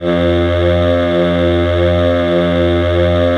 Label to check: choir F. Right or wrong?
Right